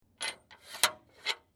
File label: Metal Latch In Out, Metal latch slide back and forth; Metal Sound Effects